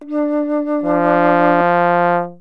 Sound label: harmonic test signal; Separation of Sound Sources by Convolutive